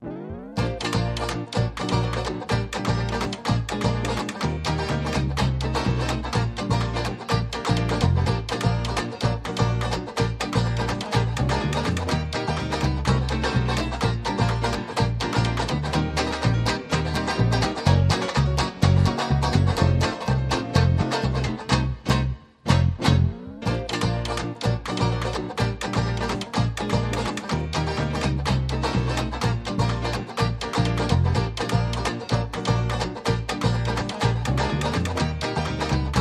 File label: Charleston, Light No Drums